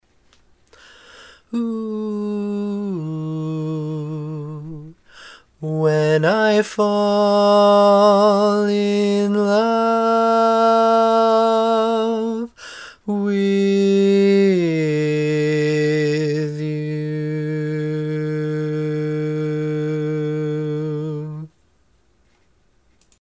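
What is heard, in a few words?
simple, Baritone, barbershop tags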